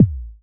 SC40 BD, Pearl SC-40 Kicks; drum machines

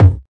KICK, Volca; STYLE KITS (Hip Hop, Etc); WallsVado Volca Sample Pack

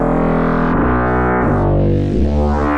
cello chaos; STRINGS ORCHESTRAL CLASSICAL LOOPS

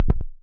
nasty stormfront; Nasty Stormfront Electro FX

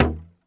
urban trap percussion dry hit; Hip Hop; Urban Trap Kit; style kits

